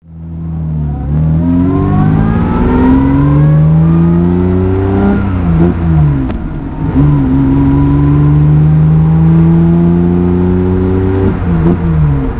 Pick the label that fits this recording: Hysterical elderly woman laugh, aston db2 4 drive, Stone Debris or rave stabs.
aston db2 4 drive